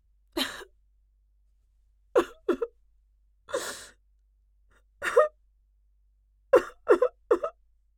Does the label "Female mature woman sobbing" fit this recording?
Yes